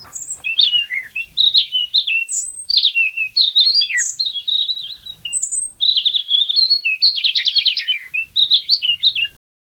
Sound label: morning birdsong with mul